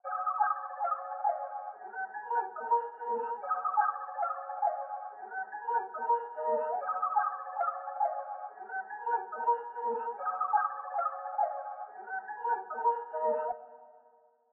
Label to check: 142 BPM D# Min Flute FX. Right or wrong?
Right